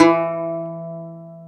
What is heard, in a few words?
Segundo Domra E2; Multisamples; Digital Sound Factory Planet Earth